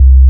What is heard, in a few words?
BASS, Drum & Bass, 100 BPM; Ueberschall; Drum & Bass Resonance